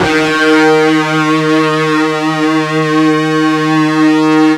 FUZZ D#2, Guitar; East West; Steve Stevens Guitar Samples Collection

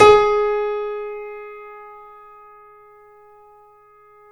C7; East West; The Ultimate Piano Collection

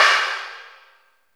crash R; Zero-G; Chemical Beats; CRASH ZOO

